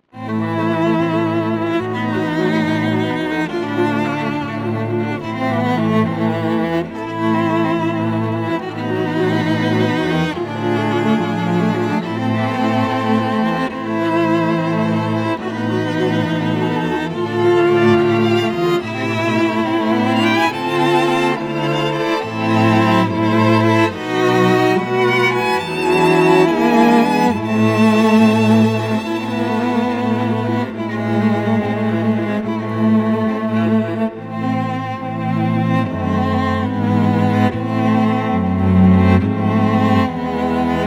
Strings; Sinestrea; Arena of Valor Traveler

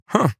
pickup; Devour 2021 gamerip pc macos

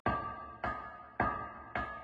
Amb Anvil hit; Pigcity

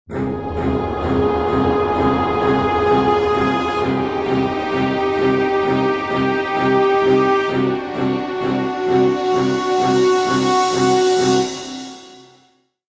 Industrial Agitated; Civilization Revolution; Xbox

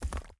Walk

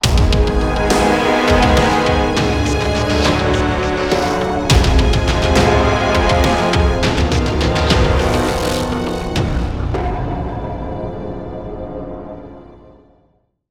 Short Signal

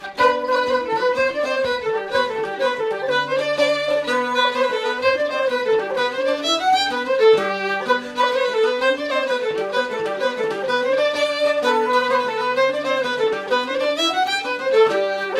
Orchestra Ambience; Assassin's Creed III

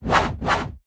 Attack Whooshes; Shadow Minion1; Portal Quest

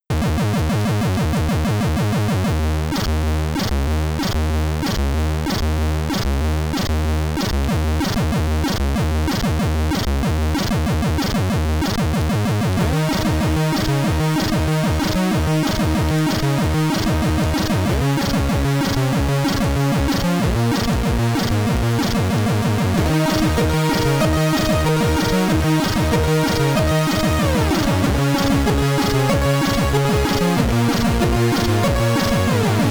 Level Complete (SID Stereo); Cyberdyne Warrior; Commodore 64

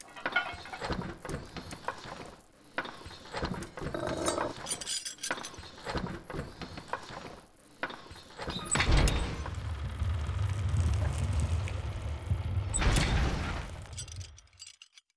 Cave Door Puzzle; adams venture origins

